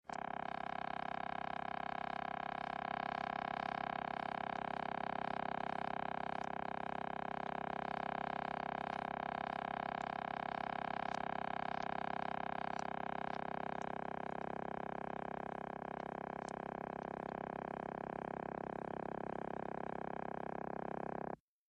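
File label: British Tel Dial Tone Ear, futz dial tones; British telephone dialing tone ( early 1960`s )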